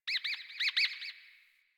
Birds Low, Ambience, Plains; One Offs; Warframe; Eidolon Plains; Eidolon Landscape

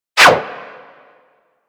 Bullet Parry Metal; Warframe; Bullet Parries

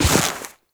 Step Snow; Enemies; Foley; Footsteps; Warframe; Duviri; Dax

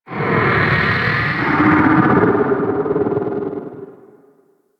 Warning Vocal; Ambience; Golden Maw; Warframe; The War Within

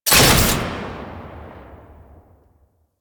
Shot, Weapons; Warframe; Fulmin Prime

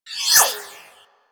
Swarm Cannon Projectile By

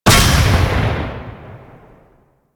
Kuva Zarr Shot B, Grineer Cannon, Weapons

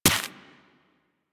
Laser Impact Generic; Surface

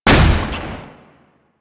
Rifle; Warframe; Weapons; Bolto

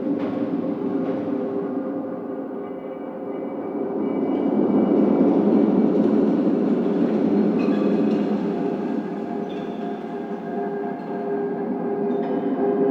Asteroid Interior Amb; Warframe; Ambience; Grineer Asteroid

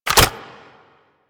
Nunchaku Un Equip; Warframe; Tenno

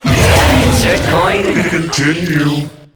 Death

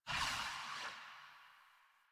Generic Footstep; Warframe; Choir; Foley

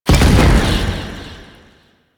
Wraith Leap Jump Land; Enemies; Warframe; Zariman

